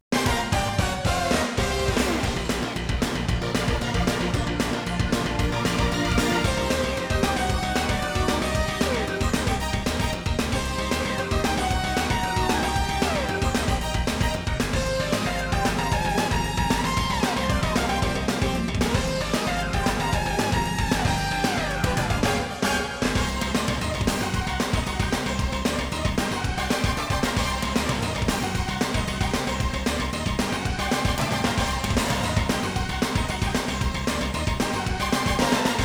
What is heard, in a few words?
Battle; dragon slayer the legend of heroes pc engine redbook